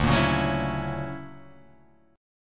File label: D Augmented Major 9th down, guitar; D+M9 strummed; JGuitar